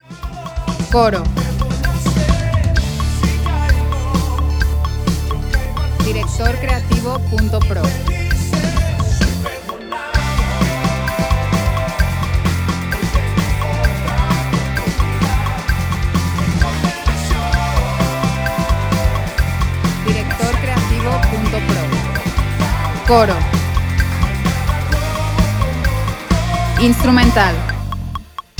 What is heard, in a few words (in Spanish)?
C, 130, 4/4